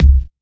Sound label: boom kick sample c sharp key, kick drum